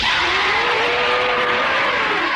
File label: roar; gomora